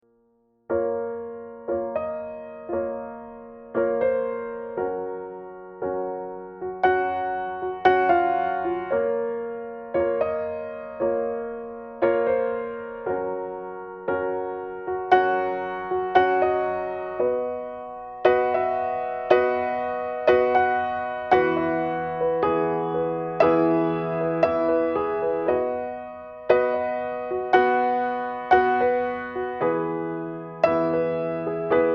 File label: Prelude